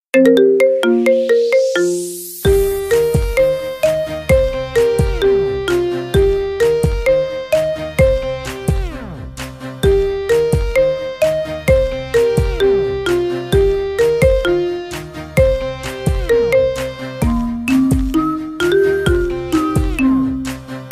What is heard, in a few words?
marimba remix ringtone